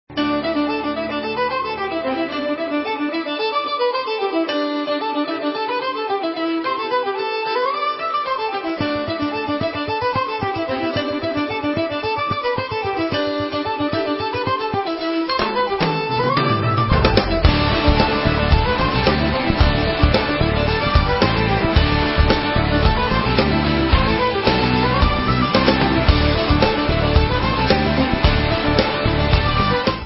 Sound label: Instrumental; Toss The Feathers